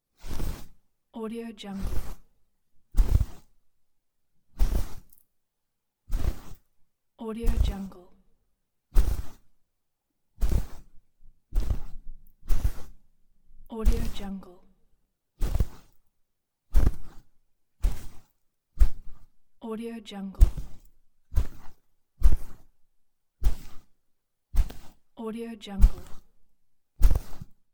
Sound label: deep foot steps